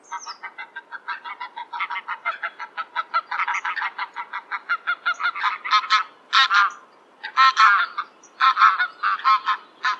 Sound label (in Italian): Oca selvatica